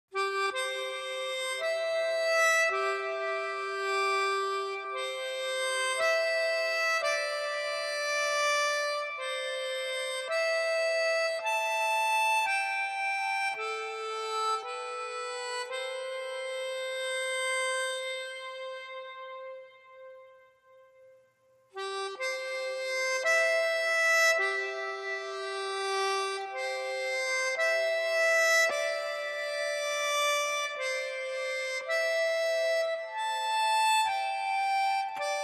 Lonely Melodica

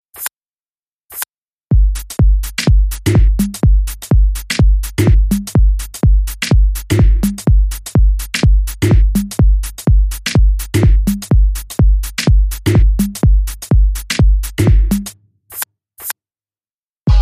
Ok Beat

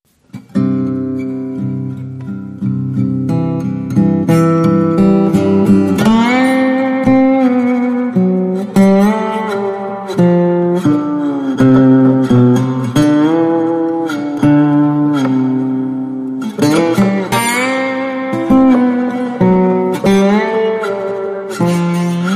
Wedding March Slide Guitar; Trad (PD)

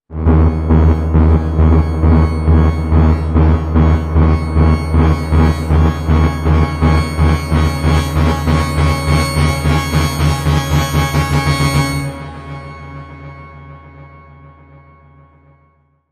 Blipsoid Sting

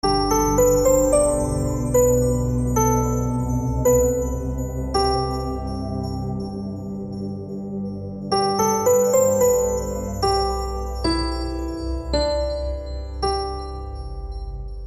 Lullaby

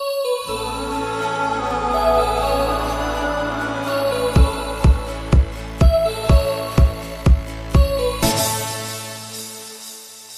Disco

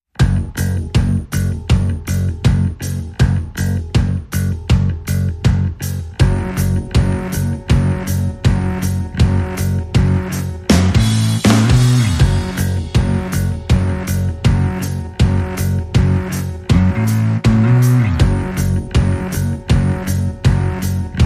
Light Mix

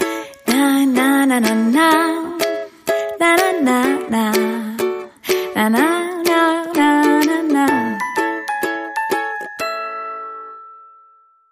Nana and Ukulele

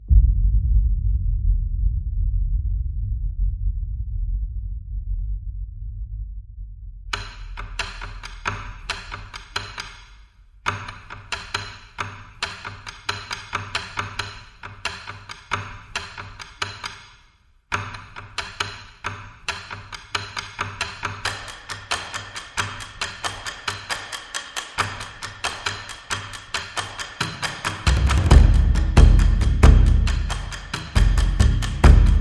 Trailer, Percussion

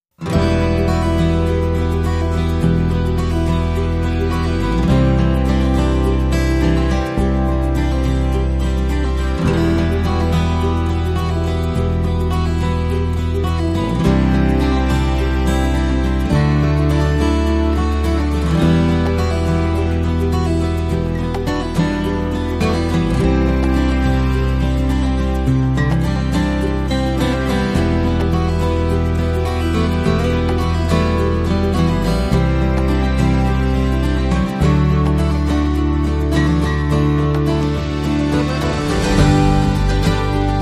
No Lap Steel Guitar

